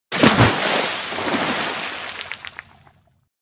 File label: Big Water Splash